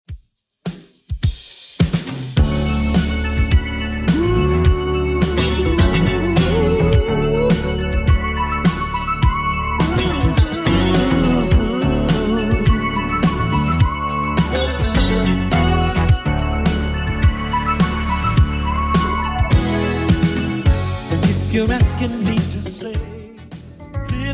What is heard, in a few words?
80s Redrum 105bpm